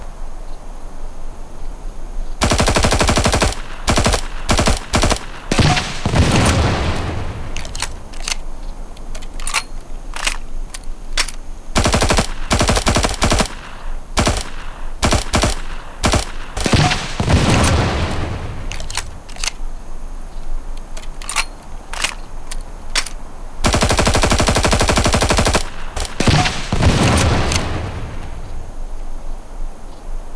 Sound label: m16 sound